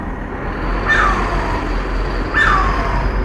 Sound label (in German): Vogel; Welcher Vogel ist das?